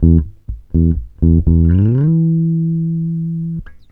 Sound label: WALT 122 E, Bass Grooves; USB Soundscan vol.30; AKAI